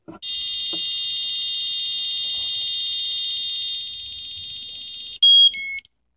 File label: Storage in buzzer